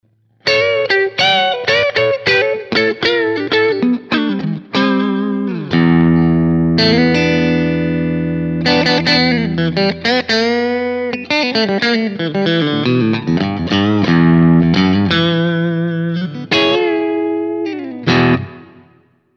EMG T System Clean Middle

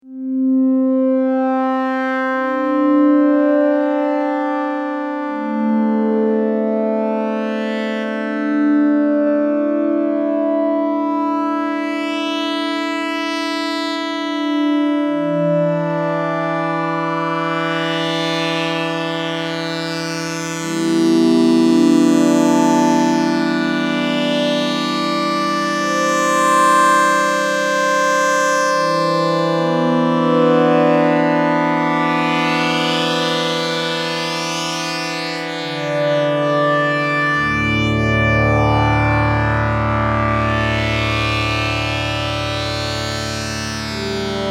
JP8 Strings

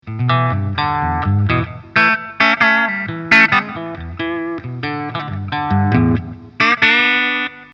gain5; NTStone

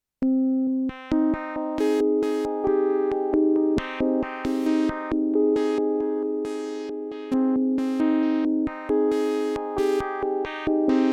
microfreak V4 Presets

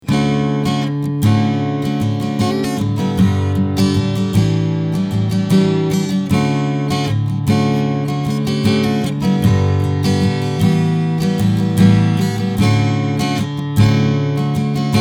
Strumming Mic

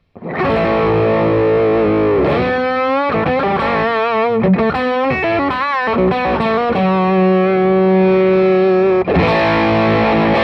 Vox MV50 Full Gain Tone

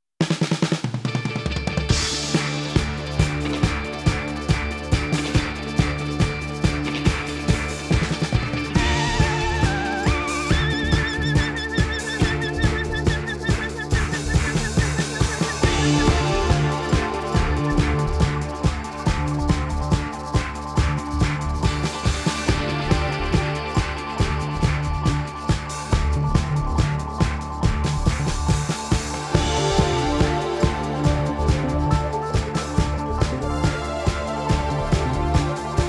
Spacerock Attack Release Log Rel AutoFast

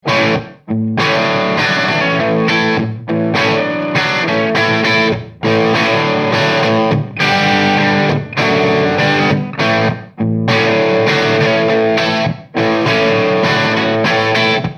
Guitar Room

